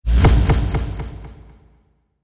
Cinematic Hit, Trailer Sound Effects; sound design

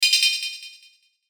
CHIME DELAY; Multimedia; Chimes; Blastwave

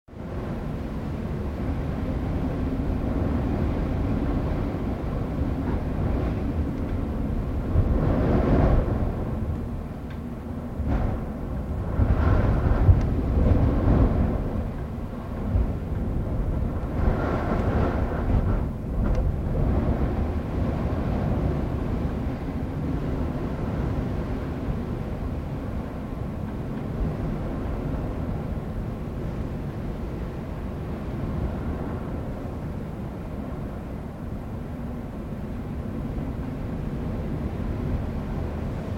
Wind in Palm Trees, Broad wind with some gusts; Weather

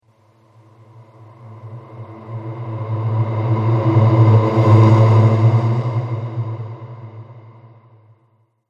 Haunting Halloween Spirit, ghost

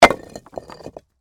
Impact Rock Drop, Heavy, On, Rocks; Materials; BlastWave

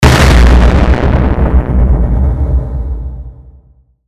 Hit Explosion, Hit Explosion Distortion; Blastwave

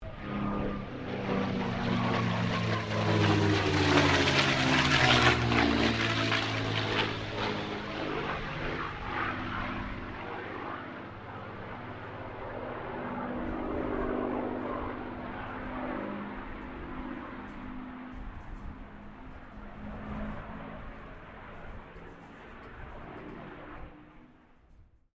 AIRCRAFT CAC CA-18 MUSTANG MK23 PASS WINDY; CA-18 /P-51 Mustang; Airplanes; Vehicles

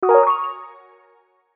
Musical Accent, Musical Accent Keys; Musical Accent Keys Pad; Keyboard Sound Effects

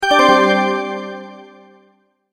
multimedia tone alert; Tones; Sound Design

